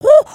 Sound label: freak out, emotes